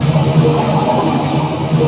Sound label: WET, WATER; AKAI S6000 CD-ROM - Volume 1